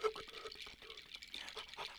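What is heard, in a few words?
Music Village Calm Near; From Dust; PS3; Xbox 360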